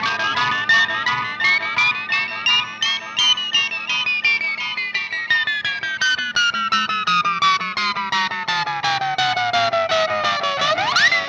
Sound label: Static FX Guitar; Sonic Foundry (Sony Creative Software); Indie Rock Anthems; Session 13 - Static